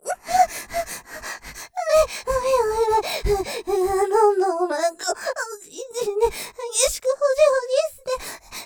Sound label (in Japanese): 喘ぎ, ふやふや声で; 絶頂スパート・イクイクイグ！！; ステレオ; ループで楽しむ！シェフの気まぐれ抜き抜きコース